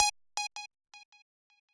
lead

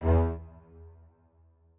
strings

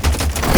fx cinema

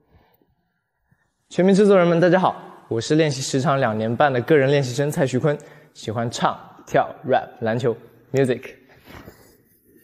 vocal

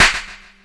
Snare; 音MAD; 命运石之门

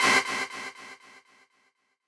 stab

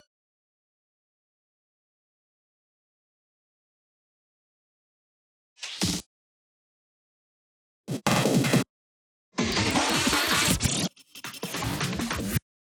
fill

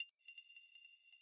arp

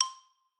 xylo

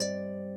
harp

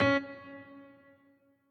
piano riff intro

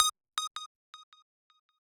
lead

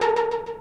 synth

